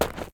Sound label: Sand step